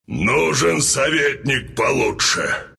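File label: death long, Russian; dota2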